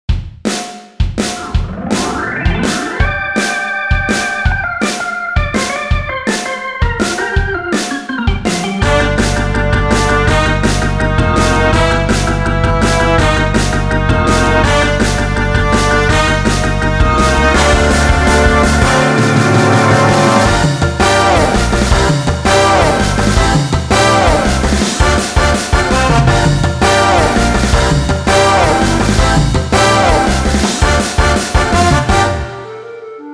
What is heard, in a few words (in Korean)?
멜로디 포함된 MR; 원키 멜로디 포함된 MR입니다.